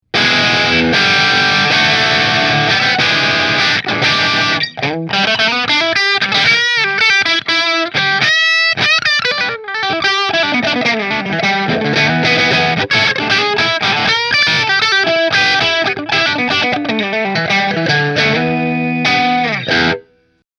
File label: Rahan Single Maple Bridge Marshall, guitars; Rahan Guitars RP Single Cutaway Figured Maple